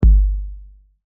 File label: kick